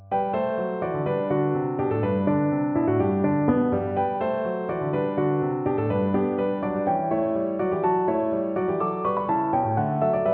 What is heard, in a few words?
Sinfonia; Vivaldi; RV 739